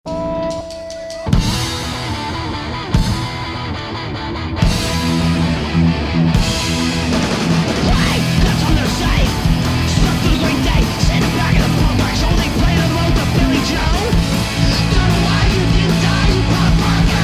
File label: Punk Rock